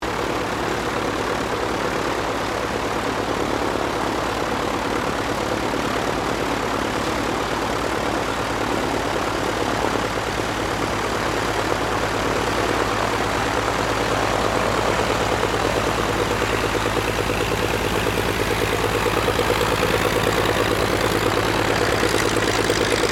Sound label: AIRCRAFT DEHAVILAND DH-84 DRAGON TAXI, Biplane; 1935; Airplane; Vehicles